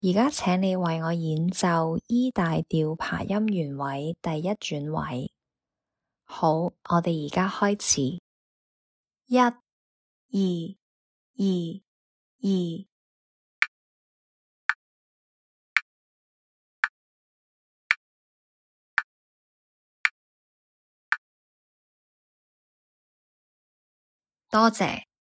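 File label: E major arpeggio in first inversion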